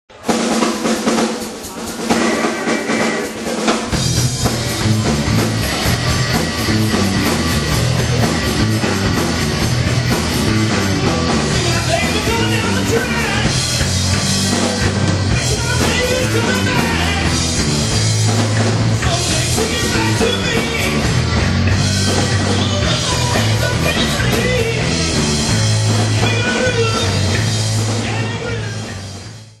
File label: Groove